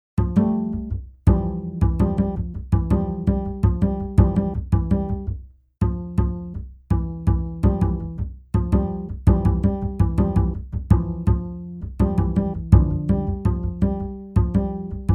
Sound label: thresh strip bend a minor natural equal temperament 330bpm bass; arenatus